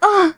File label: hurt